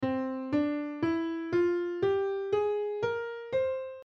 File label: c major melodic scale